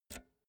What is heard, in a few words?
pull out jack multi socket; objekte; elektronik